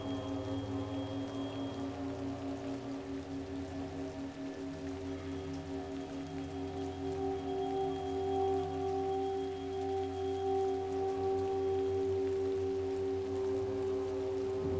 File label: Tibetan Memories with Rain